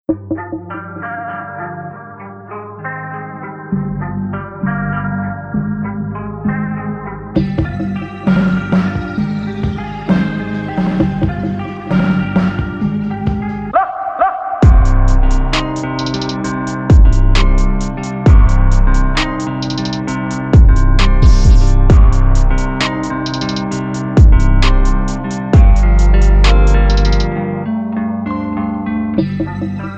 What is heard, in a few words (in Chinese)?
吉他 Electric Vintage; Session Guitarist; Komplete